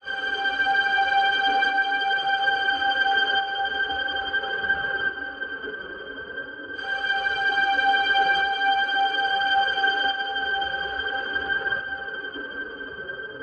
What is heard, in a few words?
143 Gm lofi string